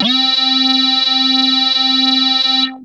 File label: Techno leads, hits and riffs